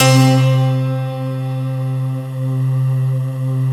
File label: JV-1080 BELLS PNO; PIANO-EXP; Syntec; Wall of Sounds